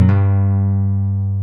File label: Jazz Guitar